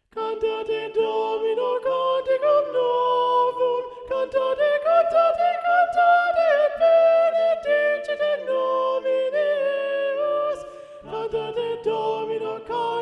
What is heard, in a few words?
Cantate Domino Soprano 1 Predominant; Claudio Monteverdi